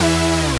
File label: synth